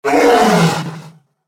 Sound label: lion